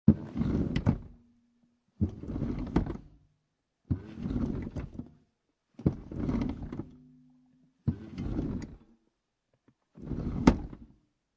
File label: Car Interior Seat Slides Many, Car Interior, 1996 Acura Integra, Seat Slide Backward And Forward, Several, No Engine; Transportation Automobiles